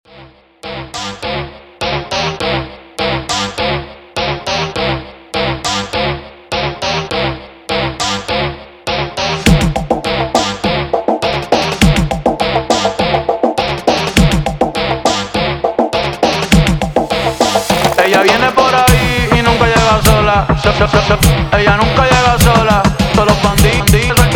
102 130 bpm transition

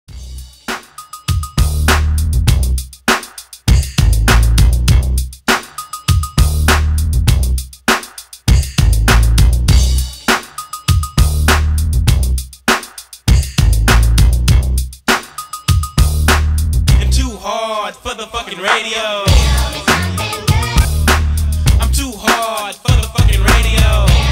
transition; og transition pt 2

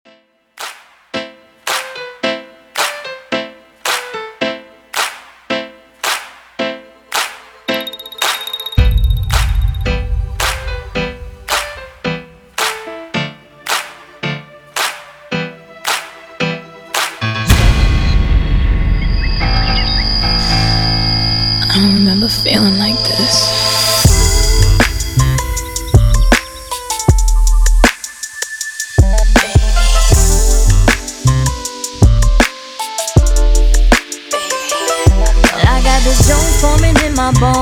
110 79 bpm transition; clean

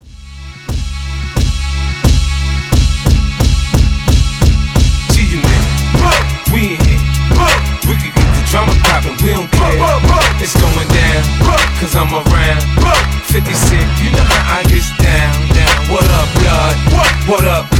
change over 85 bpm rap new old

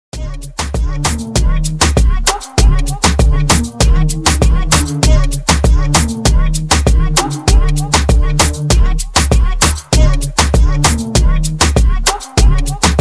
phonk club

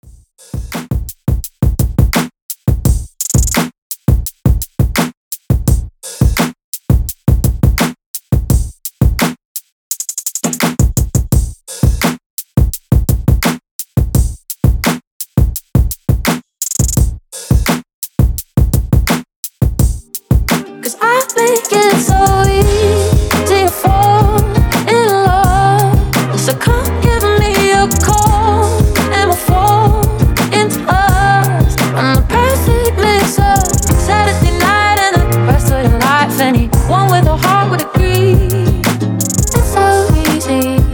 lounge mix; clean

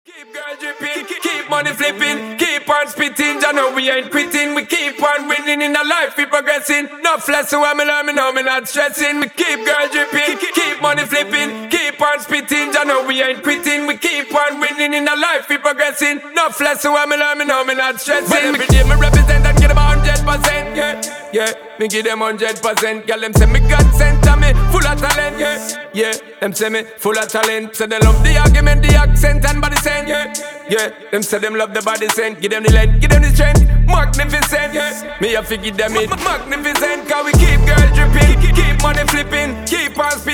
acap; clean

